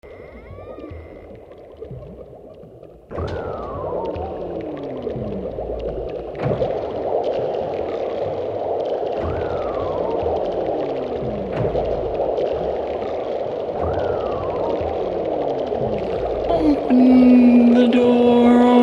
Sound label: desert, Arizona